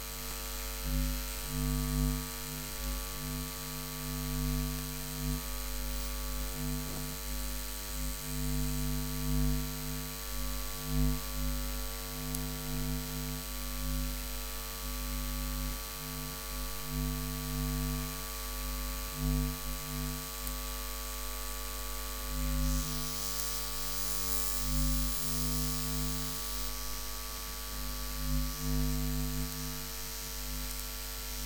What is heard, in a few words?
Noise Interference